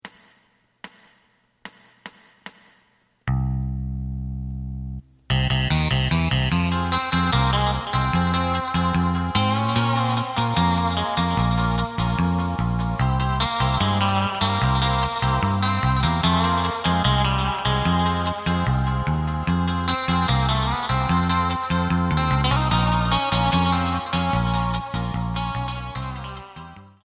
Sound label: drum